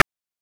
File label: PITCHED NOISE; NES DPCM; MIDINES